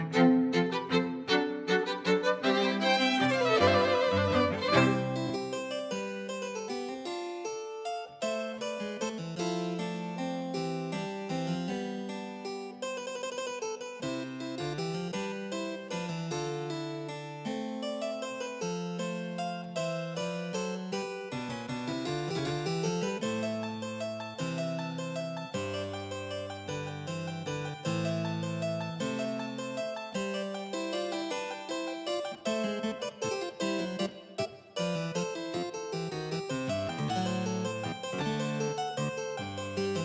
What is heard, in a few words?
Instrumental